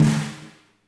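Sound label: fat snare; Spectrasonics; Burning Grooves CD2; DRY KIT 3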